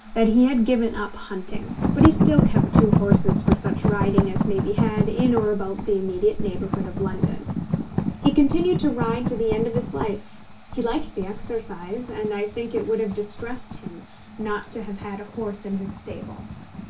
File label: noisy